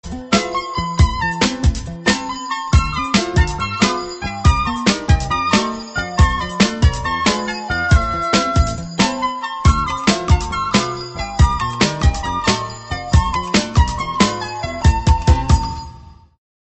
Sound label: Azeri Tune